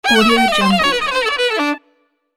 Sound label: flurry down; sound ideas